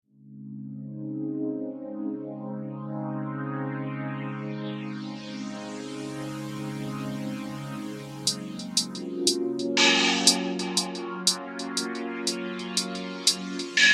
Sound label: rough song